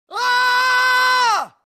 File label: scream; ffx; tidus